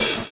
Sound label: Hi Hat; Sonic Foundry (Sony Creative Software); Drums & Drones; Construction Kits; Flutter Kit